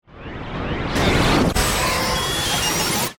Stinger Phaser, Production, Element, Stinger, Phaser, Hit; Stingers; BlastWave